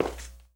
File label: walk tile